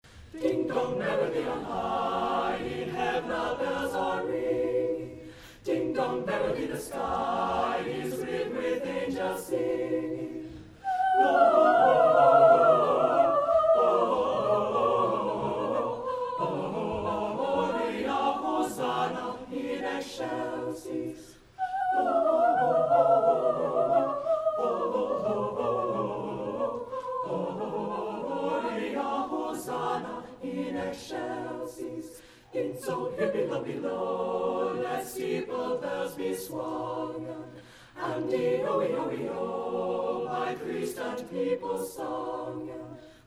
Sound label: Winter Cheer Ding Dong